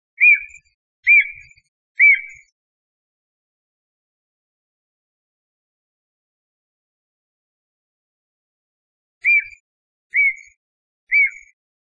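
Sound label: Japanese green woodpecker; aogera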